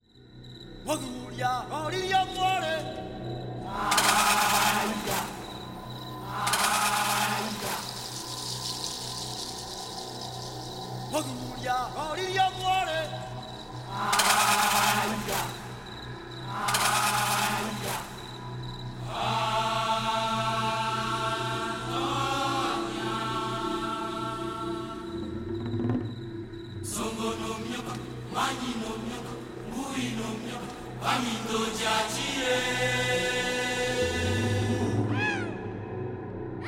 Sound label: Drums in the Forest